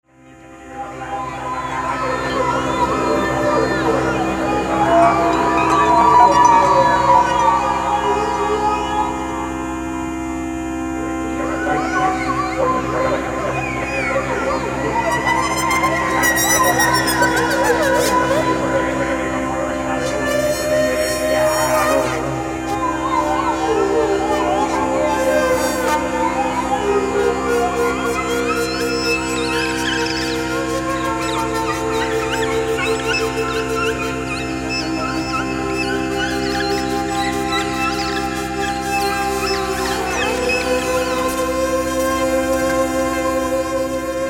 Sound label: Theremin; atelier Theremin